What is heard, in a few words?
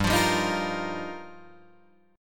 G Minor 7th Sharp 5th, Guitar, Drop D